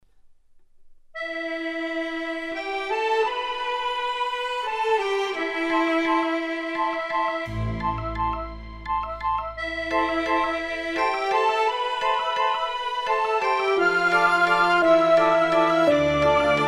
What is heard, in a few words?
Vals